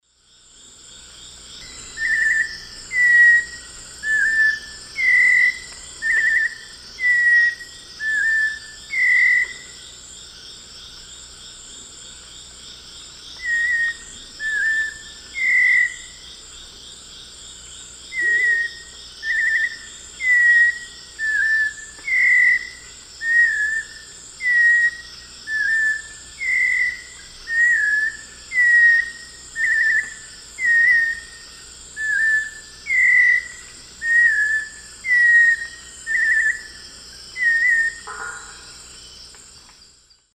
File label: Turdus albicollis, song; S. America; Eastern Lowlands